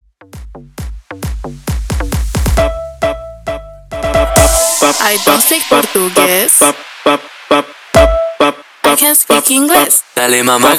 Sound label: Club Dance 134 BPM